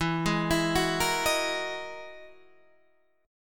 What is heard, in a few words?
E-Major Sharp 11th E; EM#11 arpeggiated; 12,11,14,11,11,11; JGuitar